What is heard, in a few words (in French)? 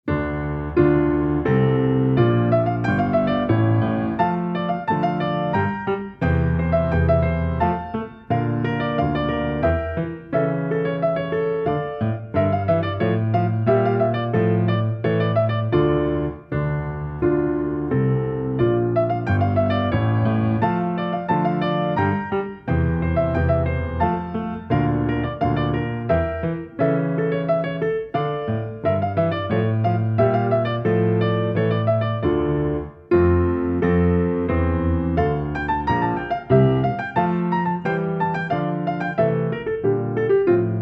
rondeau purcell piano seul